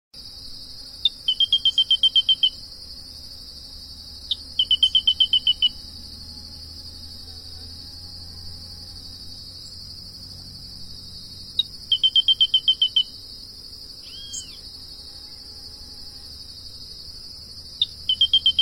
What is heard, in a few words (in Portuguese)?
canto da sana do capim